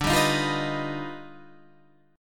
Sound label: Eb Augmented Major 9th D